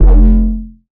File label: Bass F, Bass Synth